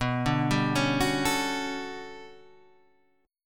G Major Sharp 11th B, chord

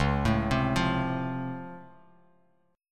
C sharp Augmented Major 7th, C sharp, down; arpeggiated; C#+M7; JGuitar

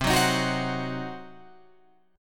C Diminished C, Guitar Open F; strummed; JGuitar

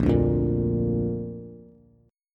Bb Major, Bass; 6,5,3,3; Bb strummed; JGuitar

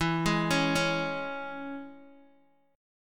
E 6th, down, Guitar, Open D

